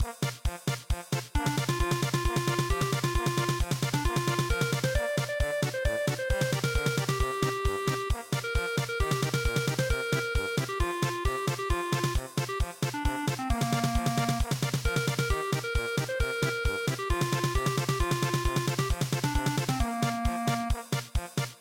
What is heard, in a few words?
yama polka